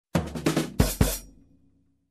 Funky Drum upbeat fill

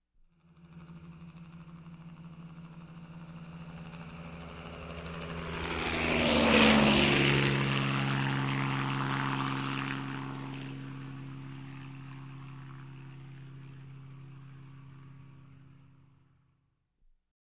Helicopter Flying Over with Whirring Sound

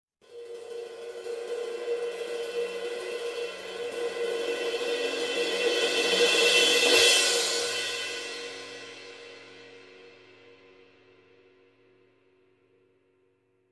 Long cymbal swell building tension

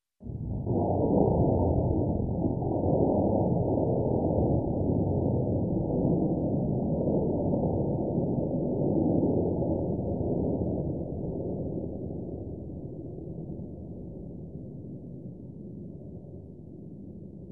Shockwave Explosion

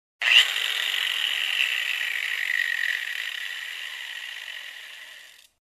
Construction

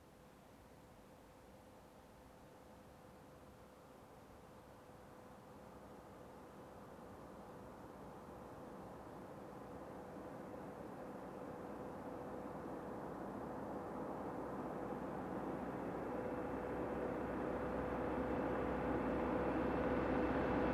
Car approaching and parking

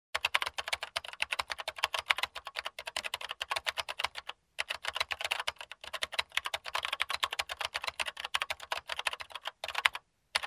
Typing on old computer keyboard

